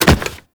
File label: Land Soft Default, Footsteps; Warframe; Teshin